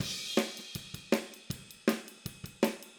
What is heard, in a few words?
Hi-Hat, Drums, 162 BPM; Take Your Toms Off